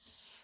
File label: Slide paper on paper